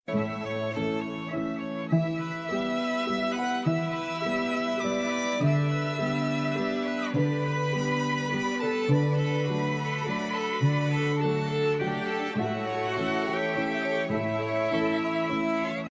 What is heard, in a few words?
violin play